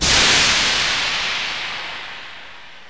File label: tiger train